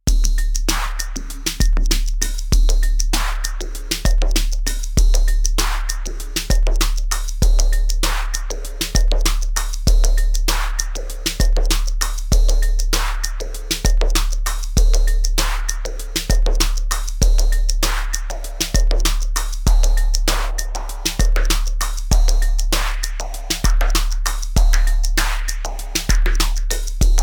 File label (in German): 7X7 neue ACB Drums mit Beat